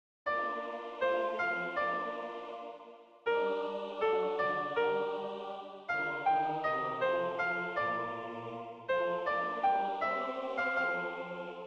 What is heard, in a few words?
SOPRANO; Brockes